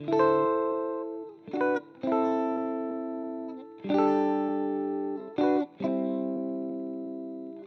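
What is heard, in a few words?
electric guitar, ethno, 125, A; eJay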